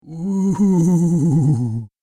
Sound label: Wooh Funny Ghost; Ghost Sound Effects; Andrew Fleming